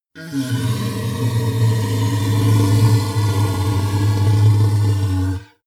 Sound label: monster inhale low; kreaturen